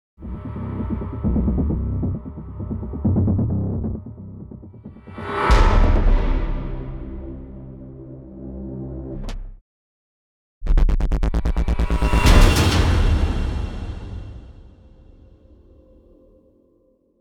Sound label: Music; Fatal Attraction Generic